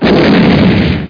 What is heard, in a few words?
Kaboom!